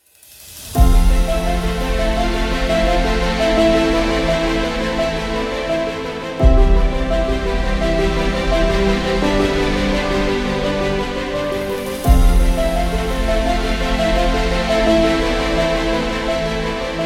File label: Cinematic Piano